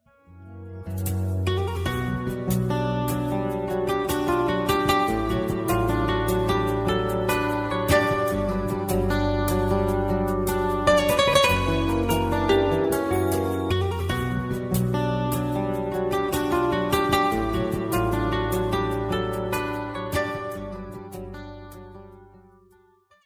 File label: Guitar dream